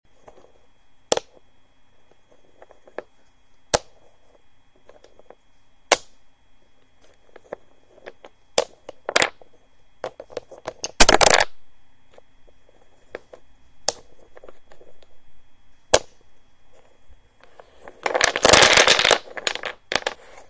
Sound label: jenga